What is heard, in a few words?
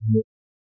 cut; noteye; roguelike